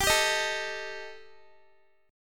G Suspended 2nd Sharp 5th, Guitar; Gsus2#5; strummed; x,22,19,20,21,x

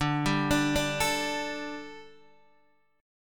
D Suspended 4th D Guitar DADGAD

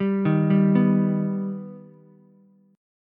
B Augmented B Ukulele, chord; arpeggiated; JGuitar

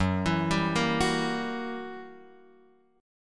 Gb 7th Flat 9th down Guitar Open F, Gb7b9 chord; arpeggiated; JGuitar

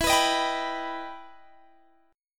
E Minor Major 7th E down Guitar Standard; EmM7 strummed

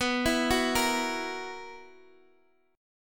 B Major 7th Suspended 4th, Guitar Open G chord

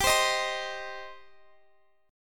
G Suspended 4th Sharp 5th, Guitar, Open F; Gsus4#5 strummed; x,x,19,19,18,19

